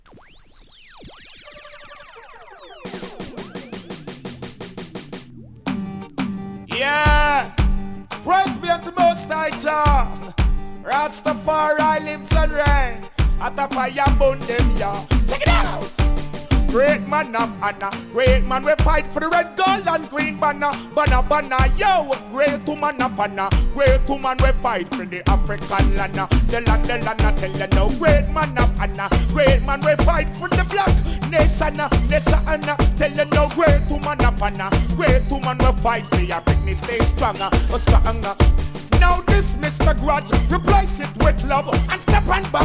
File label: riddim